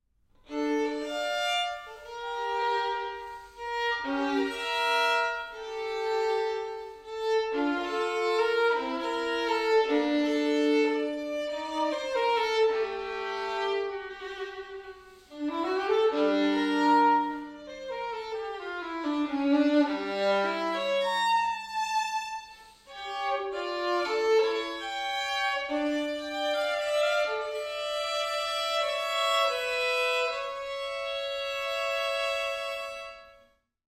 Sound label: Violin solo